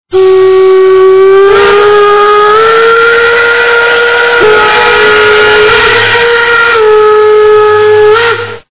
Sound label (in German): pfeife